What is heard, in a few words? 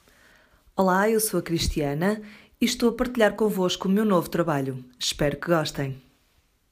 jingle; radiobc